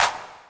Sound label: normal hit whistle